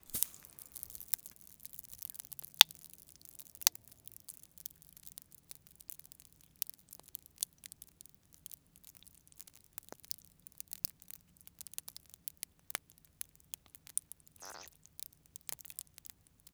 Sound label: fx crackles; Cartoon - film effects